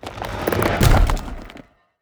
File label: enemy stone Golem death